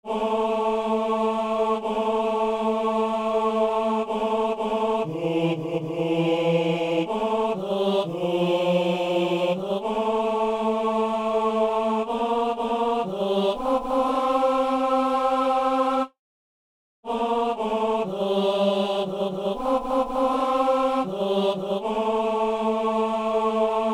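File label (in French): ténor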